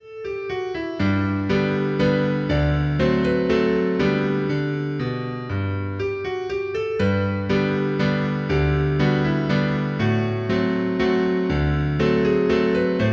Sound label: TINIKLING INSTRUMENTAL